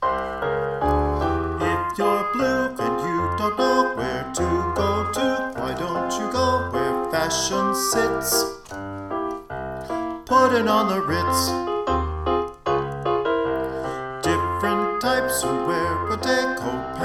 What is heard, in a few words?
with low voice